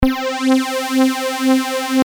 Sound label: unison